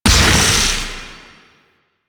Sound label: Archer Disengage Ability Explode C; Warframe; Duviri; Duviri Dax; Abilities